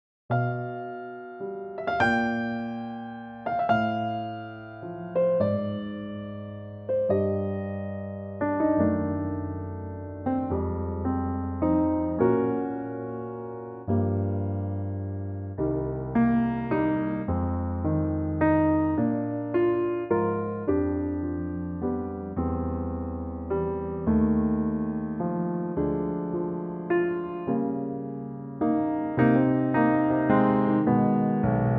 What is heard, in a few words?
piano; key - Eb - vocal range - D to Eb